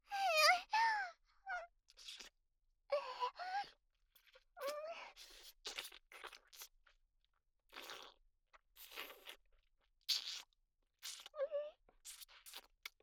イラマチオ・抵抗しながらも恐怖に怯えながら男性器をぺろぺろと舐めている声; 生意気少女系カノジョ

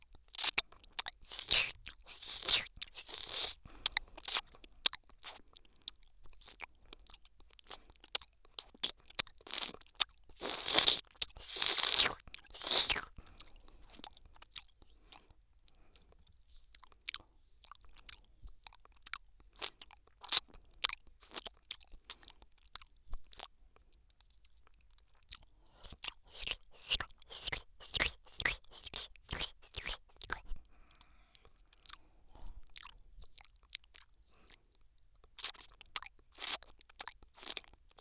右耳アイス舐め

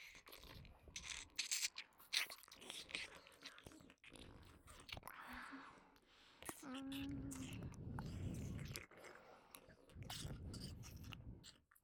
全身舐め

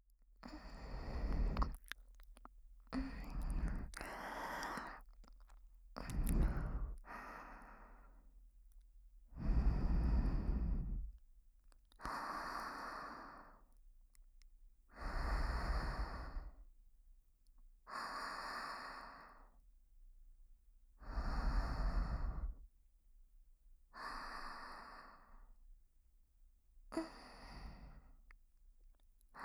寝息 妹; SEなし

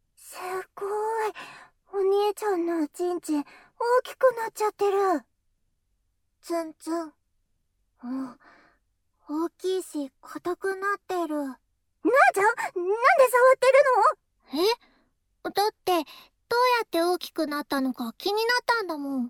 耳舐めとくちゅくちゅ手コキ, ASMR

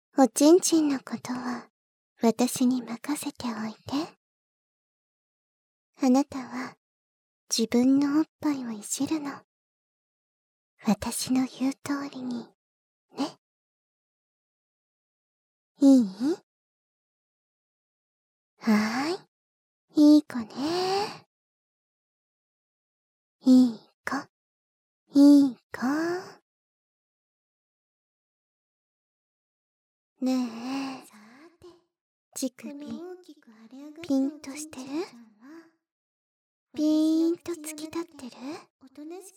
フェラチオしながら（自分でする）（SE）; 左右反転

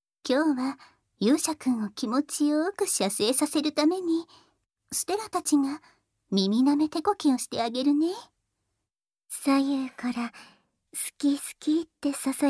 好き好き耳舐め, ASMR; ステラとマリー

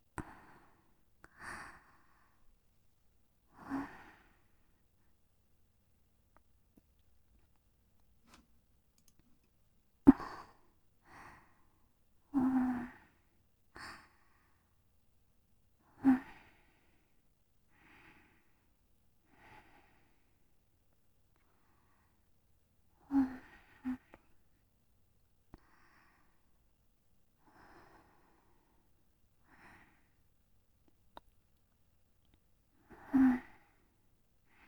寝息(寝言入り)

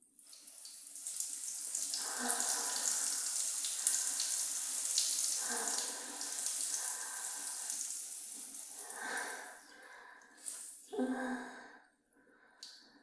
おしっこ お風呂場 ステレオ

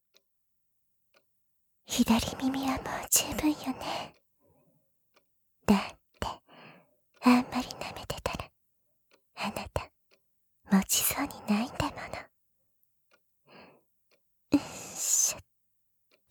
耳舐め R; まだ耐える; 宿屋のお姉さん

